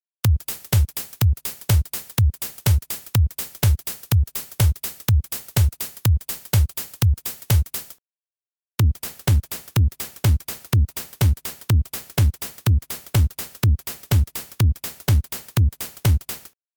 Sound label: Drumloop; AROMA